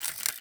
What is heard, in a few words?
ui Control Config choose; interface